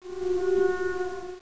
Menu Popup; TOS

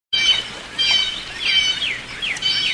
Error Stop; Cottage By The Stream

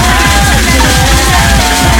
atomic loop

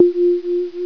critical stop; Embossed

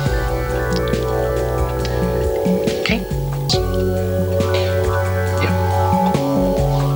jam loop

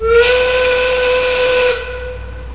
train whistle

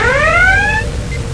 Alert; Star Trek

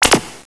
zap

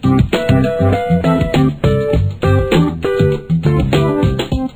funk loop; pollypocket

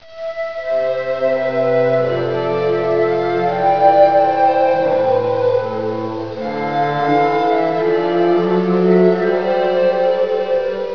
shrine start windows

